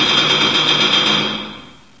ladder

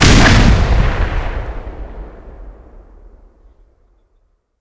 explode; valve

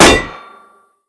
ric metal, weapons; valve

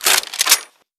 reload, crossbow

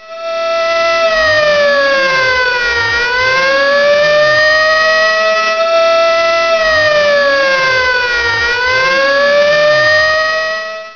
siren

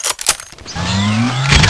gauss clip in, weapons; valve

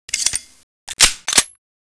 357 mag change, weapons; valve

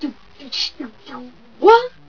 sing; valve; elf

